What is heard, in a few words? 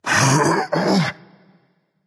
grunt lift berserker; cuchulainn